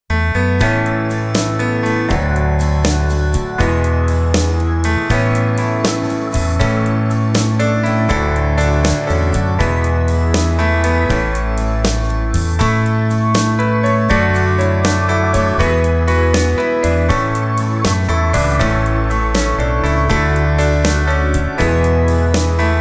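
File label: Shuffle Ballad Mix, organ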